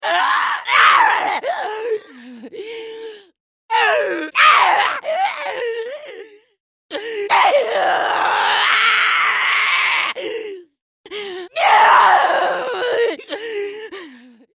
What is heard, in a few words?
zombie efforts